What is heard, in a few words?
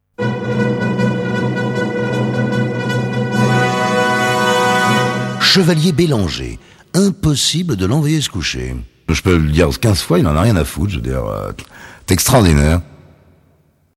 jingle; dorval; supernana